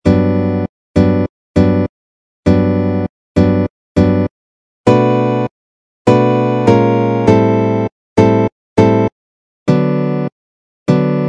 example augmented chord progression, guitar

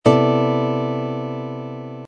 c major thirteenth chord voicing, guitar

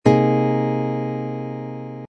c minor seventh sharp five chord voicing, guitar

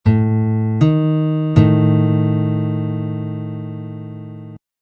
sharp fourth flat fifth, guitar

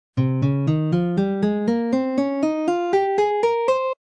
c phrygian mode scale pattern, guitar

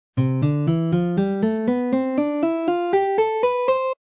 c ionian mode scale pattern, guitar

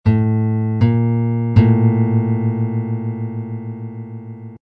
minor second

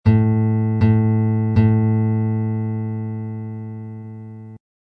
unison, guitar

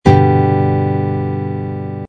g major, guitar

